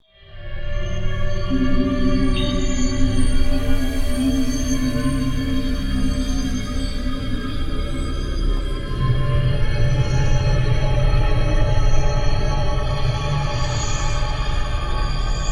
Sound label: Horror Ambient W Chorus Verb